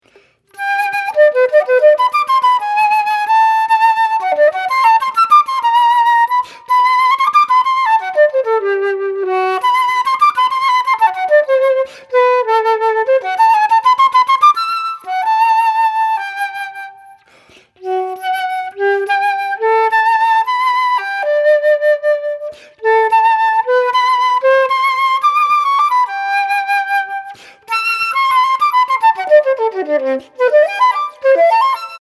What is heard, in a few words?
waltz romance